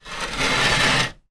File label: chair wood patio folded drag scrape hard ground; zapsplat